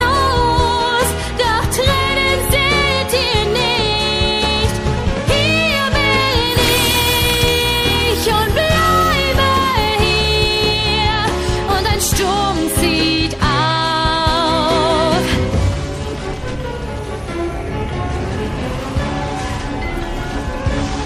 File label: german ringtone